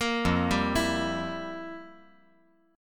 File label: F Major 7th Suspended 2nd Suspended 4th